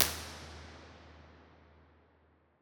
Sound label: Geometric Stairs Average, st pauls cathedral, IRs; ms stereo